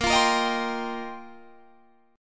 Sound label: F 9th Suspended 4th Bb, chord